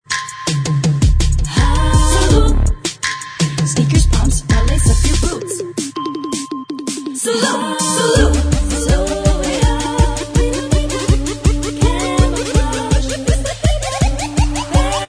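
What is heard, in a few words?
backing vocals Track, backing tracks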